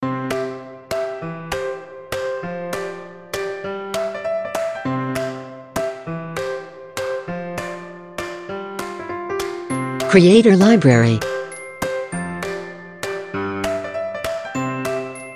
Piano Clap; Corporate; Ad; Creator Library

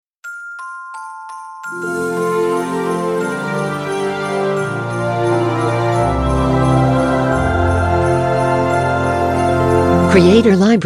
Christmas Logo

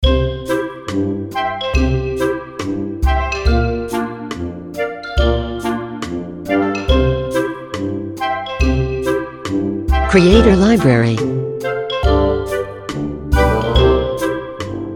Wacky Loop

